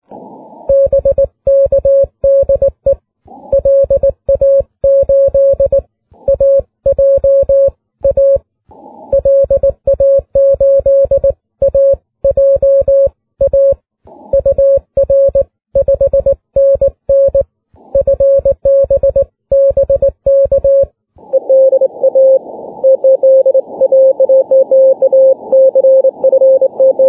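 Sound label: 12m continuous wave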